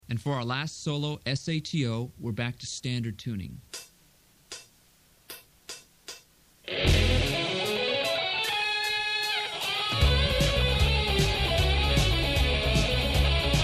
solo

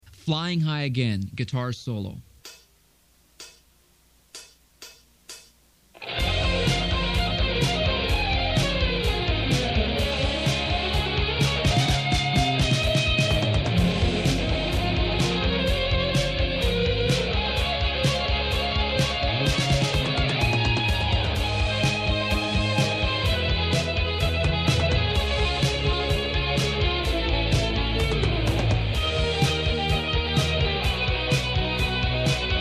solo

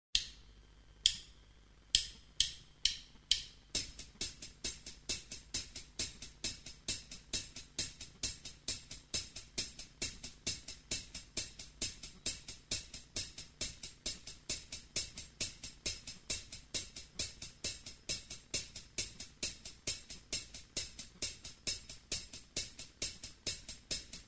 Backing Track

